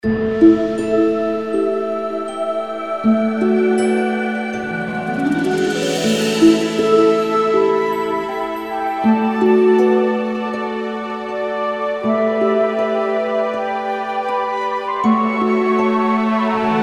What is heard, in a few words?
Bgm Elf Field